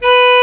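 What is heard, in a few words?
Bass Clar mf B3; Digital Sound Factory Virtuoso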